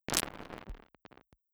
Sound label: dice sound, plastic dice wax floor